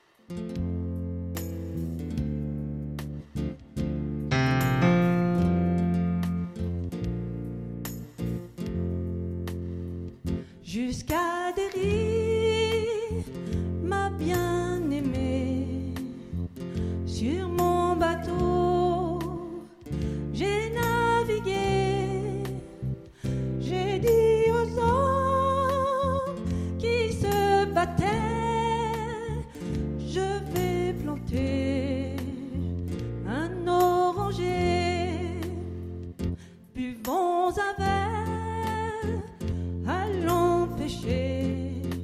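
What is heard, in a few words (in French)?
soprano; chants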